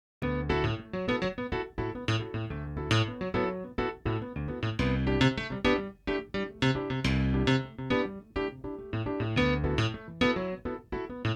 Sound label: cp70; Arturia CP70 V